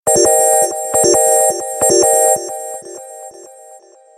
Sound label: Samsung Galaxy S2